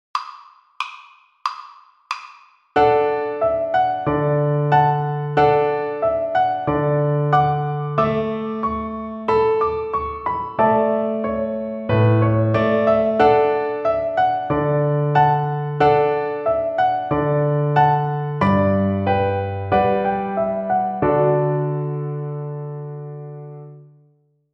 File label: String Gym, Piano Accompaniments; Etude 13 - Quarter, Half and Whole Note Harmonics